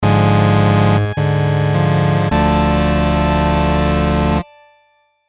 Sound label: 8Bit Action Lose; Bogart VGM